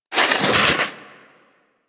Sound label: Armadillo Roll Snow; Warframe; Hunting Critters; Foley